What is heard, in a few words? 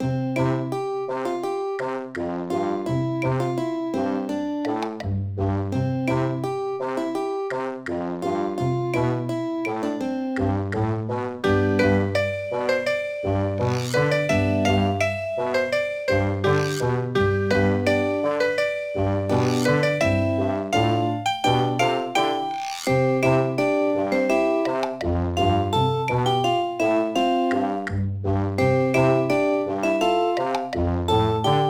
Music Theme; Tales to Enjoy Puss in Boots